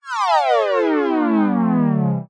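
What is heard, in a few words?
cartoon descend fall elastic; zapsplat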